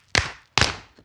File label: bone break; anemoi